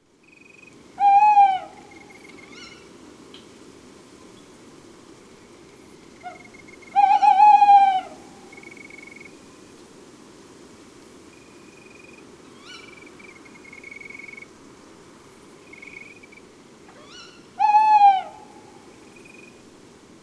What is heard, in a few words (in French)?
Chouettes hulottes; Chaneac; Ambiances Sonores d'Ardeche; Studio Les Trois Becs